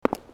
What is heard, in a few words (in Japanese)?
kutu; easyrpg; Ib